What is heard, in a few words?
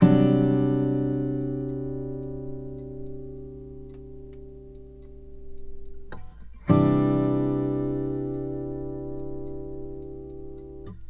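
Country Guitar Breakdown; Digidesign; Pro Tools Essential Loops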